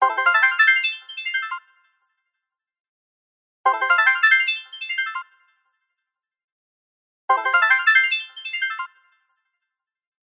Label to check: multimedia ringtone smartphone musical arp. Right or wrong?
Right